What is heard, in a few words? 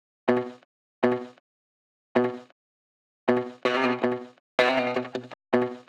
160 C maj Guitar Part Dry